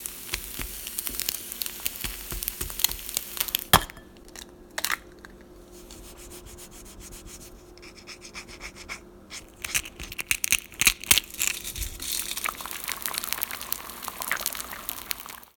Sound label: Egg